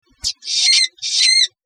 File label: indiv call odd, vswift